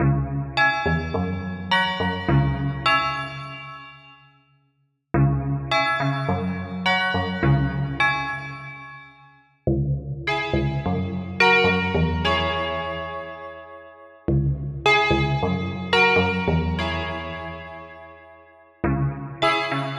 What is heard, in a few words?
happy lent cimb; archibald editions